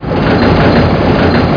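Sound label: TRAIN DOOR; outlaws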